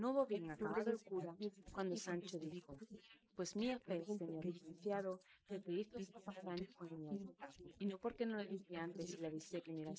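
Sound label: female, instantaneous mixture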